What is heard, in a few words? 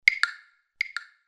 Ebony, ringtone; Samsung SGH-F310 Serenata